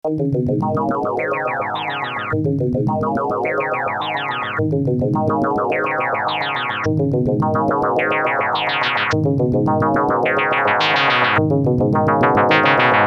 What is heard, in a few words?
filter seq